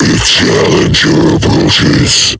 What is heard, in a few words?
alert; kfsmr flesh clown